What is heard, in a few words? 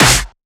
SnareClap; FLPRO